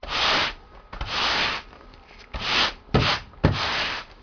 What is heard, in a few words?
household